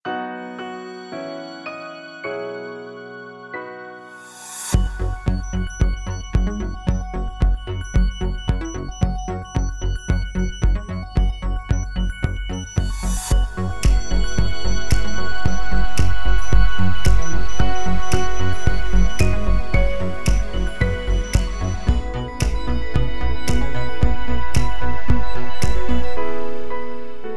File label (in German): Klingelton; Der lange Klingelton für Ihr Handy, Ihre Veranstaltung oder Vertonung; Helmholtz-Soundlogo und -Klingelton